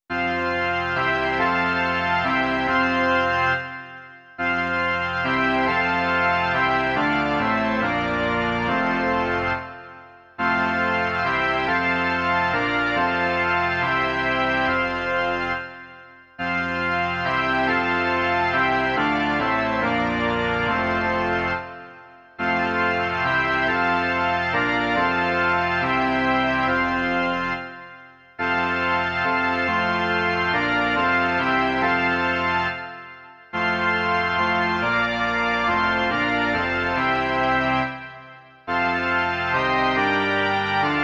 varhany; Sláva buď tobě, Bože náš

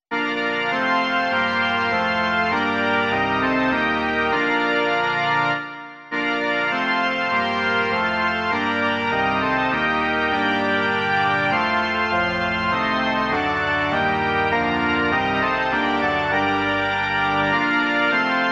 varhany

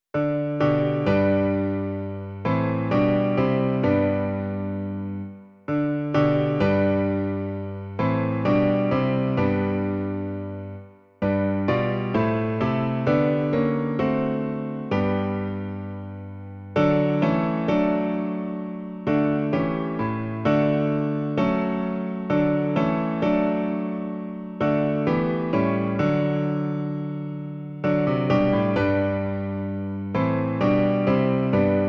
klavír, Klavírní verze

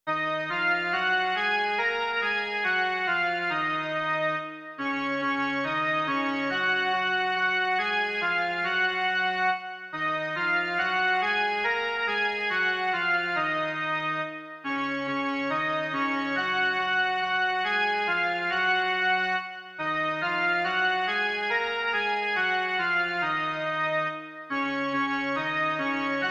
jen melodie; Blahoslavenství v době postní; Doprovody Farského liturgie

